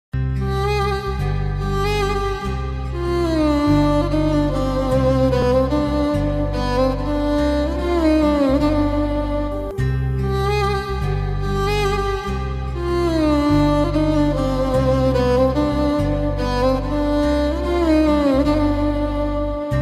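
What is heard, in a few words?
emotional background music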